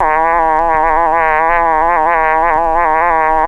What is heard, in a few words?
FX LOOPS